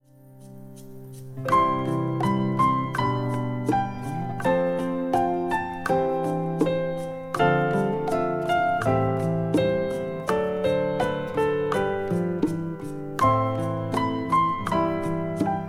Opening Melody Arrangement; YI

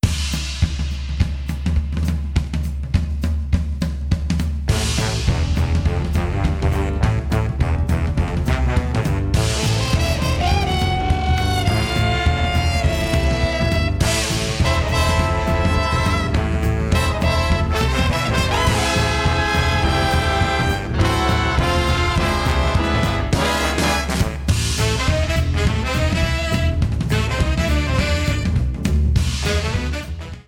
Swing Arrangement; DKC; DK Island

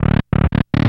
Door Open

